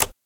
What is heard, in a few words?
Button Flash Car